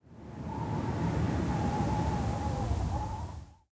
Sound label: WIND, wind; zones